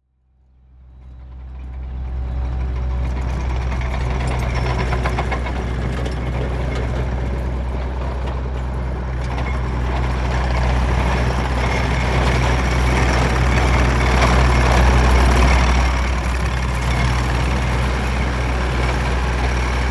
Transportation Tractor Running

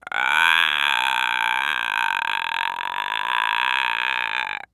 Horror Scary Human Female Roar Evil Roar

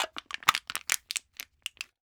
Household Can Soda Can Crush

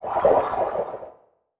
Multimedia Internet CD-Rom Flash Wipe

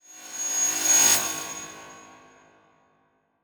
Transition Reverse Surge Reverse; Production Element

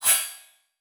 Multimedia Internet CD-Rom Flash Buttons Short

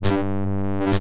Sci-Fi Electronic Electrical Surge; Science Fiction

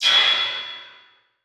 Multimedia Internet CD-Rom Flash Pop Up

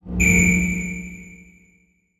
Beep Low Pitch; Multimedia Internet CD-Rom Flash

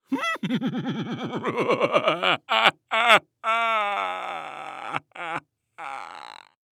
Human Male Laugh Pompous King Laugh

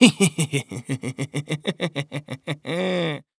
Horror Scary Human Male Laugh Evil Plan Laugh

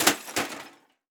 Impact VCR Dropping On Pavement

